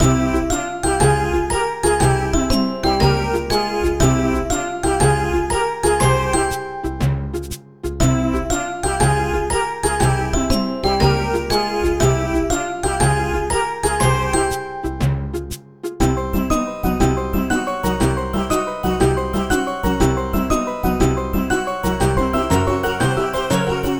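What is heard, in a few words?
background music lobby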